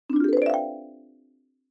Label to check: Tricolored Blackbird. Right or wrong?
Wrong